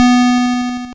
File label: GB Synth B; SYNTHS; CHIPSHOP DELUXE; CHIPSHOP HITS